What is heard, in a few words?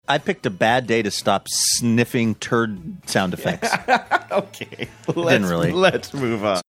sound effects